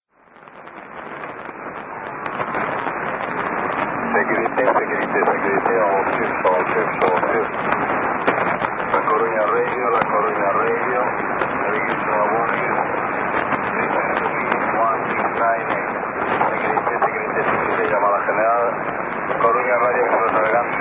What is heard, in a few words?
usb